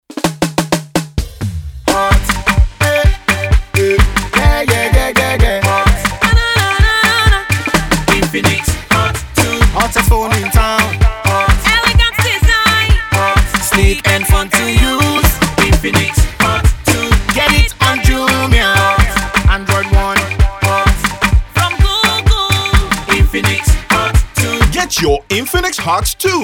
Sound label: Jingle; Infinix Hot 2